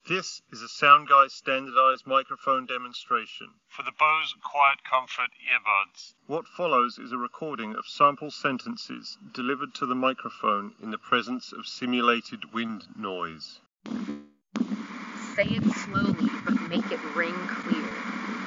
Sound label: Wind microphone sample; Bose Quietcomfort Earbuds